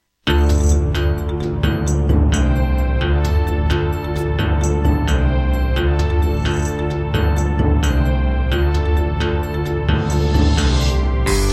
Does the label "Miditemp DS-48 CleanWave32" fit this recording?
Yes